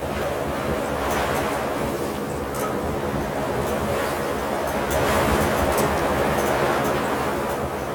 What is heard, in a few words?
forest cliff, ambient; Garry's Mod; tf2